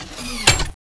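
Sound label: smg clip out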